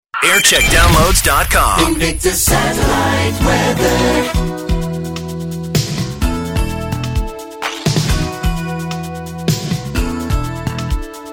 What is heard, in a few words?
jingle montage; invicta